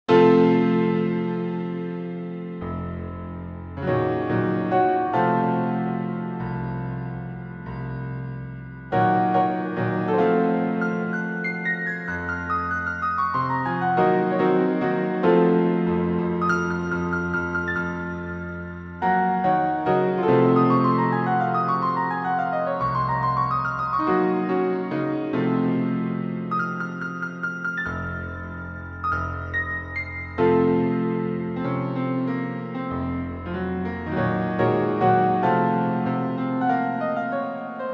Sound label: reverb